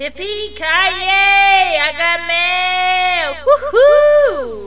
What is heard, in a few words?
mail; Motorola MPX200